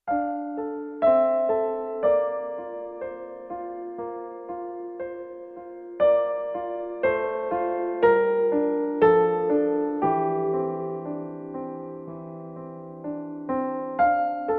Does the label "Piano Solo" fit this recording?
Yes